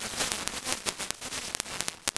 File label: noise